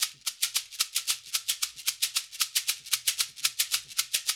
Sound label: LOOP, SHAKERS